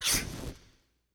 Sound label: Flamethrower Burst; Weapons & Explosions; Sony Pictures Sound Effects Series Volumes 1-10; Sony Creative Software